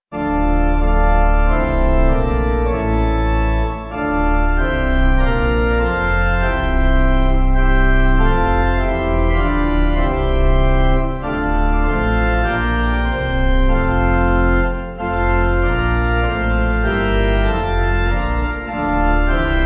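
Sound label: Pipe, pipe organ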